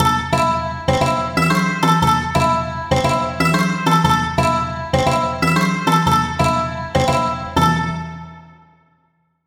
和ジングル(盆踊り風)

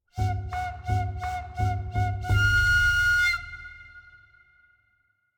篠笛と太鼓

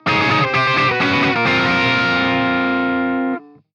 カッティング下り

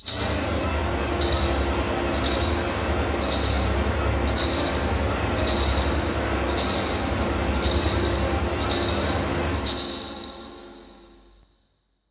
不気味鈴＆バイオリン

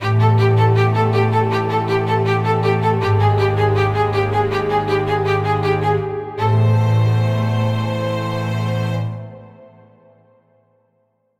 マイナー調

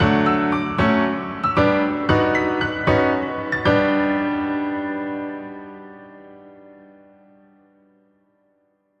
ピアノ単体ジングル

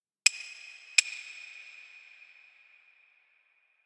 拍子木 カンカンッ

